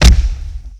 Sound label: Mega Body Punch; Hand To Hand Combat